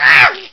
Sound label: zombie hurt female; left4dead2